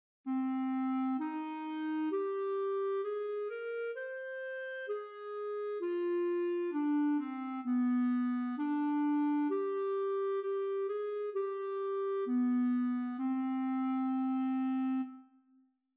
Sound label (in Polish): dyktando c moll